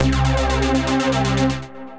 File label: Sync Pulser, Synth Lead; Ableton; Factory Packs; Synth Essentials